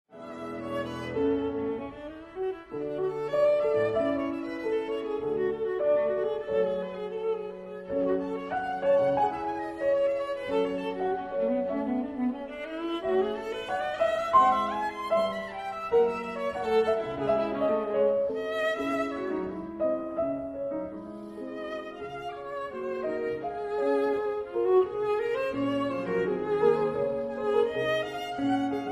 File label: Sonata for violin & piano in A major Allegretto poco mosso